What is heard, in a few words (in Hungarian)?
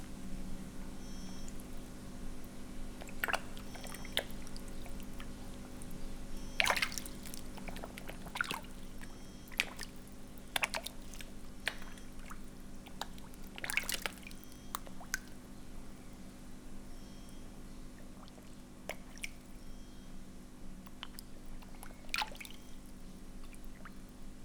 etetés, aranyhal; jászberényi zoo 2015